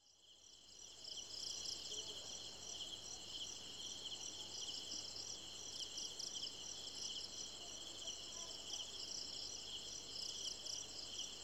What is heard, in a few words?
insects jungle atmo